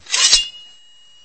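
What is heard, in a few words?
zvuk kogda obnazhili mech, sound when a sword was drawn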